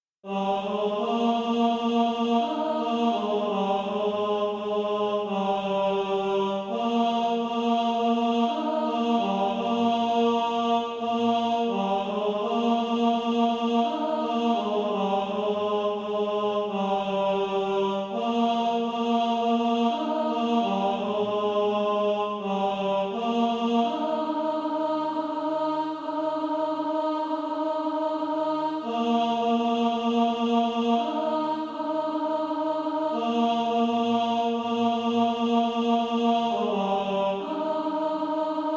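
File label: tenor, SATB Practice Music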